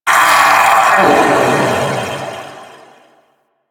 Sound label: Vocal Death; Warframe; Duviri; Dax; Vocal Set A